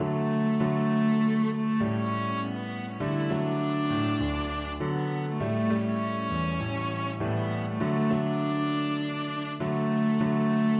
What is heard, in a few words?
mocking bird, viola